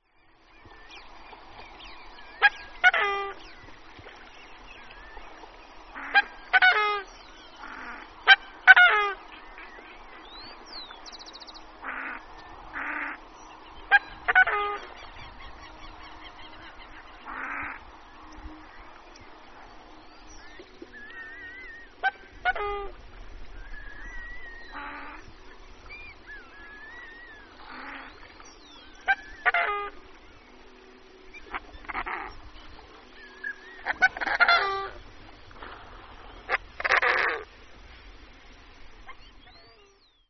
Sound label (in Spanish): cisne coscoroba; lampa; municipalidad de lampa